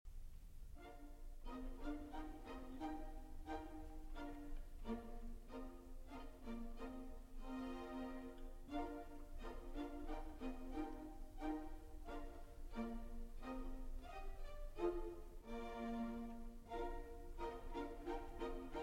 Cello Concerto No. 1 in A Minor, Allegretto Con Moto; Saint-Saëns; Op. 33